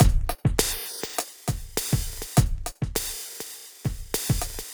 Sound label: Beat, Electronic Beats; Electronica